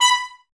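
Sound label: trumpets, brass; 90 s Sample CDs; Miroslav Vitous; Woodwind Brass Ensemble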